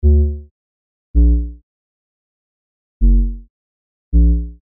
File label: Fm bass